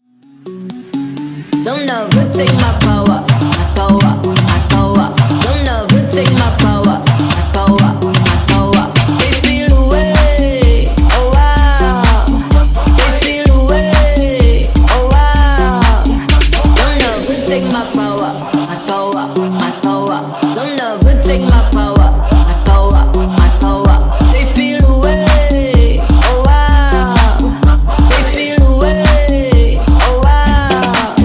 Funk Mash Up Remix Clean 127 Bpm

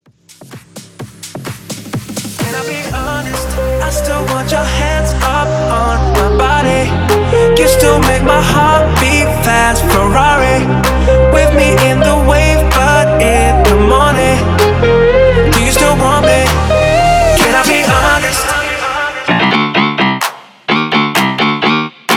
Basshouse 128 BPM; Re-Cut

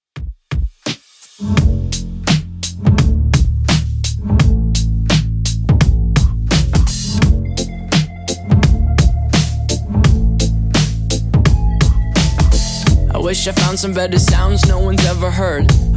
85bpm

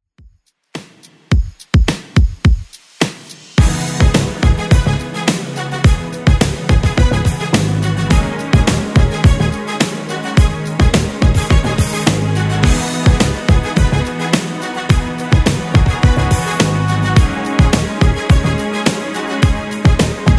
80s Redrum 106bpm

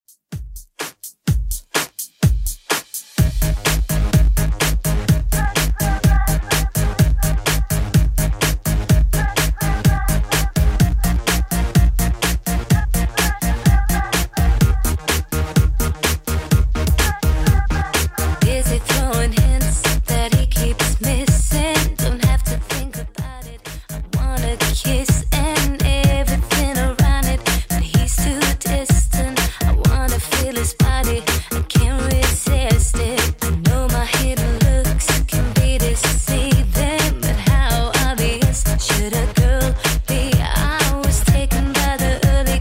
2ks Redrum 126bpm

